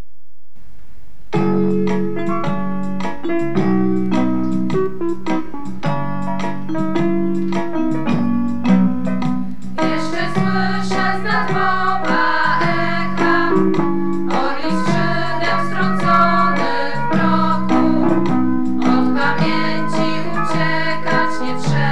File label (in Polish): hymn szkoly